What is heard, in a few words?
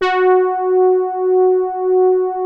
soft brass, poly mid F#4; Music Store - Claudius Diemeter Schwerlos